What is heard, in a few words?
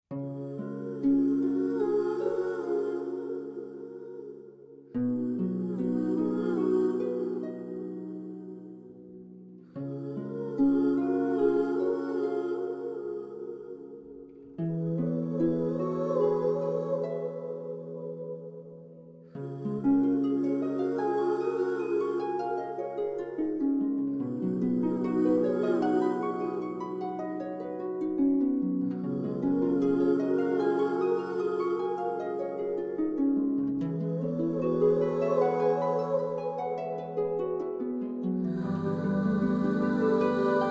wish backsound; genshin impact